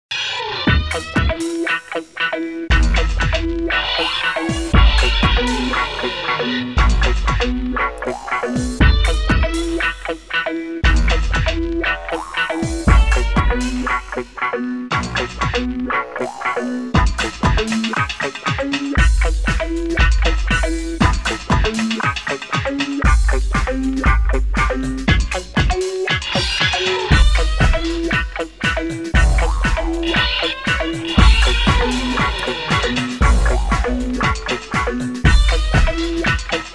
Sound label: electric gtr